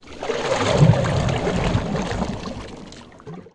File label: Canoe Paddle Stroke Underwater